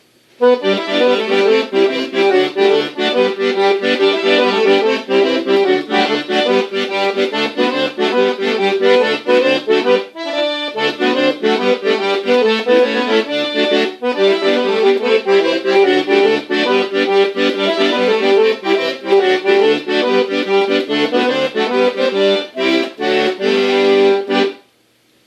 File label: accordian